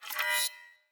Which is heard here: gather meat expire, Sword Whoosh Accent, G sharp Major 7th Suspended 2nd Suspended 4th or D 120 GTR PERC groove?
Sword Whoosh Accent